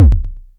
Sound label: kick, Korg ER-1; 300 Drum Machines; Kit 20